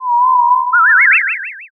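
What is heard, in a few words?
uguisu, Japanese bush warbler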